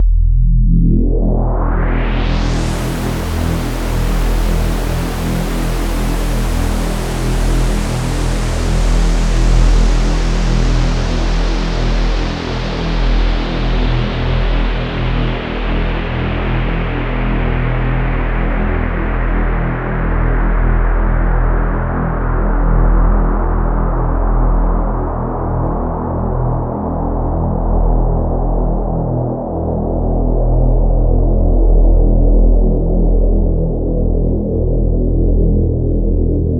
reaktor sounds, unisono extreme 333 voices lp4 q50